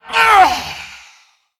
Female Tolvir Death

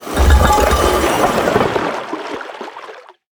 Submerged Spacerock Death

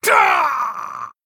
Male Night Elf Death

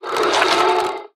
Painted Canvasaur Attack

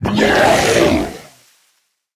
Bog Creeper Death

